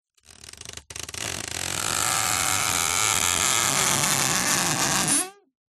plastic tape stretched rub creak squeak; foley; zapsplat

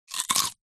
human eat bite potato raw single

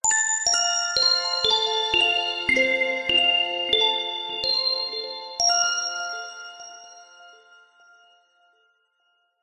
magical musical accent bright chimes; zapsplat

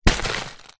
leisure lego box full place down on floor; zapsplat

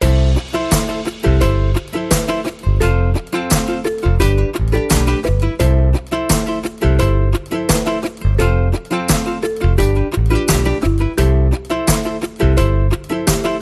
music Happy; orlamusic

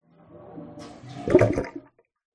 sink plug gurgle from bath draining backwash pipe; household

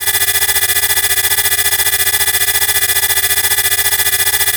science fiction scanner burst long; sound effects one

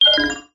science fiction computer malfunction beep roomy; zapsplat

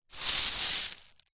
small stones scoop up by hand; foley; zapsplat

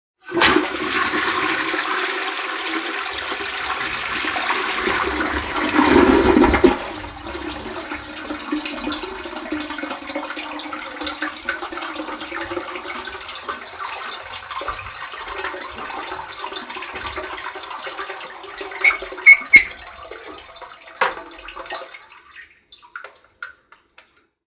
toilet old flushed; household; sound effects; sound effects one

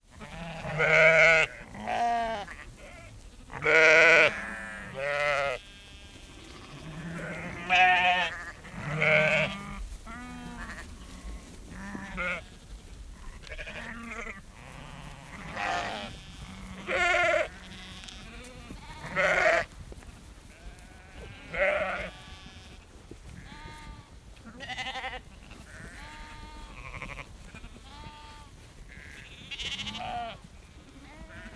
animals sheep herd walk close bleating

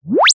cartoon whistle slip trip

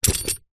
animals horse saddle set down ground; zapsplat

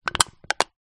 foley drink can cola squash in hand gently

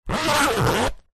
plastic document wallet zipper; zapsplat

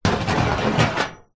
foley metal scrape on metal panel

